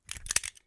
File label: Metal Flick Reload